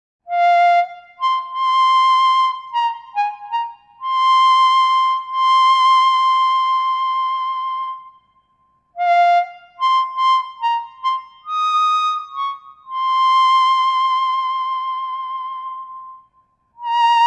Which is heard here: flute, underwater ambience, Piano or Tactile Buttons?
flute